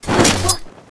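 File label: harpy damage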